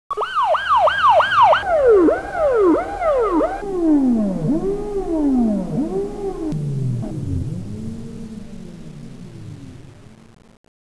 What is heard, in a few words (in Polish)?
sirena pol